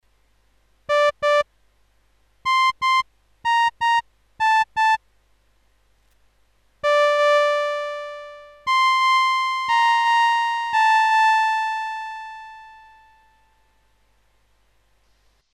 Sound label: Cello sustain variations